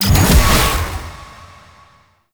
Railjack Blink Ability; Warframe

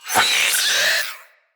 Spider Elite Vocal Death; Root Spider Elite

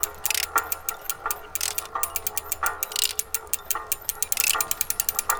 Game Disc Table Gears; Ambience; Props; Warframe; Duviri

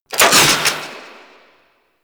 Flak Cannon Movement; Warframe; Grineer

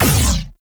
Gatling Laser Shot; Warframe; Railjack; RailJack Weapons

